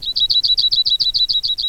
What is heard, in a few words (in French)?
bruant zizi